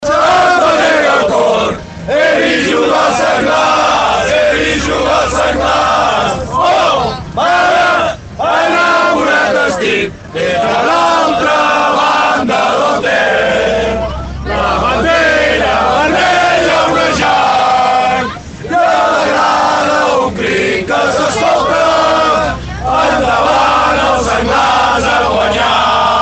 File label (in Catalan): Ambient bus senglars